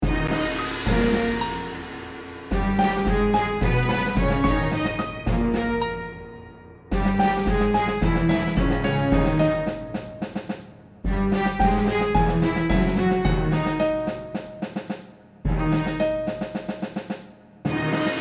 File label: Orchestra; dragon fantasy book i the volumes of westeria